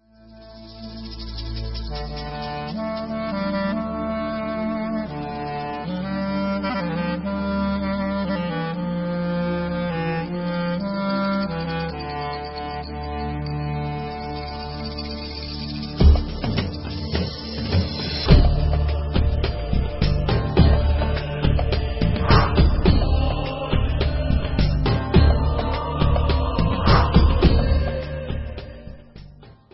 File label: Instrumental